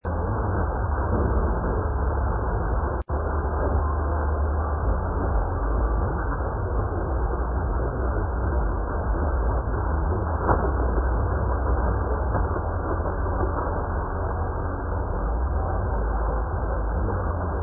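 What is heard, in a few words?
Romania, radio; R Actualitati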